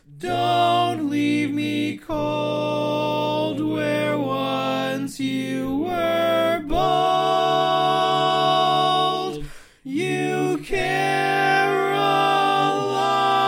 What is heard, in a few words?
Tenor